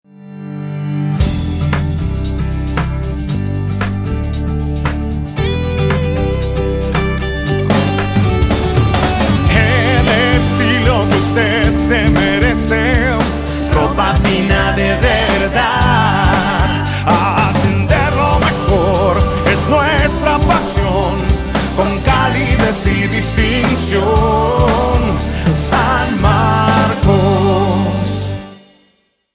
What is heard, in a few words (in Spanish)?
jingle; san marcos